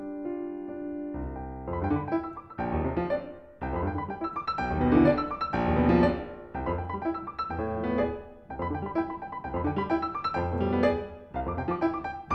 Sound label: Ballad